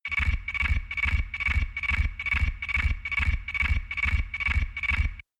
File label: crickets; brand x music